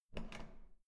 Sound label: door handle up soft